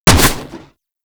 Auto Crossbow Shot; Warframe; Weapons; Tenno

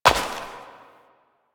Step Flesh; Warframe; Koumei

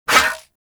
Disarm Dart Shot; Warframe; Pacifist

